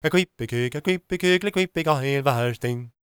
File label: GAELIC, World Voices; ILIO; Vocal Planet